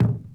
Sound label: Tom Dirt